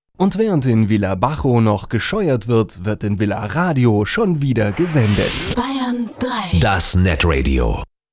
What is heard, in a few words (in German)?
Jingle; Vilaradio